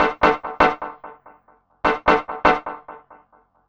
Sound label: Synth, Techno